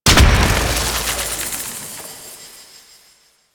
Gardening Cactus Explosion; Warframe; Duviri

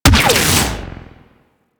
Gas Laser Cannon Biped Fire; Warframe; Corpus

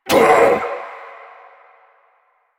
Stalker Pain Grunt; Warframe; Quests; Jade Shadows

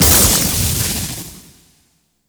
Core Reactor Destroy; Warframe; Ambience; Core Rooms

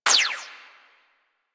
Fire Shot; Burston Prime; Warframe; Weapons

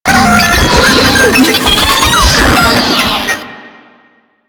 Brachiolyst Idle, Vocals; Enemies; Warframe; Proto Infested

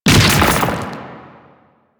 Octopede Horrors Arm Spawn; Warframe; Man In The Wall

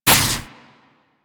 Laser Impact; Warframe; Weapons; Corpus; Crewman Laser

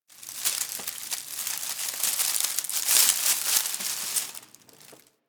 Tree Crackle; Ambience; Interior; Warframe; Orokin Derelict

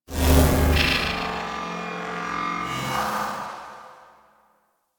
Mag Idle Noble Power; Warframe

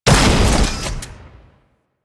Flak Cannon Fire; Warframe; UT Flak Cannon

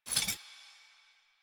Idle Sword Drop

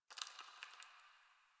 Ice Crunch; Warframe; Ambience; Nineteen Ninety Nine; DE

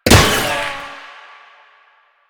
Tank Shield Impact; Warframe; Lasrian

